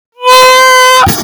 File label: aahhhhaahhh